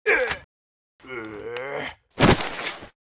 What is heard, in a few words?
DIE, Mob; vafferslrae